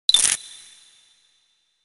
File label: Equip Ice Eggs; Banjo-Tooie